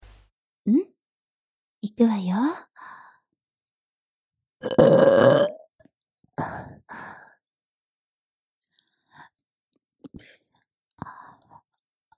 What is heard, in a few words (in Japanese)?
ママゲップ